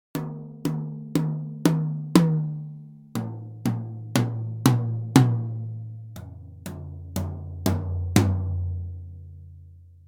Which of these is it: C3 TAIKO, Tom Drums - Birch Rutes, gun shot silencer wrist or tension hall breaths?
Tom Drums - Birch Rutes